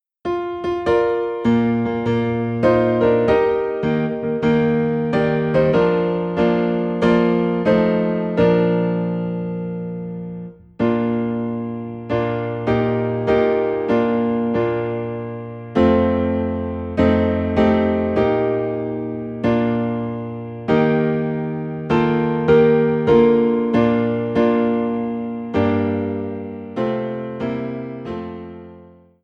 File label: Piano in B Flat; Christ Arose